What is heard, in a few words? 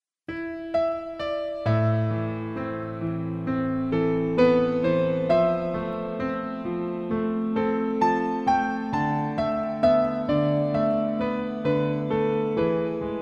Violins